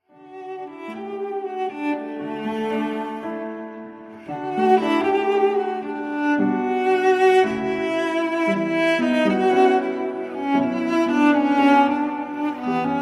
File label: Live Violin Cello Piano Cover; Aladdin; Disney